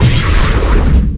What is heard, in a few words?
booster exp; weapons